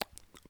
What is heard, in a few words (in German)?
Klick Dumpfsequenz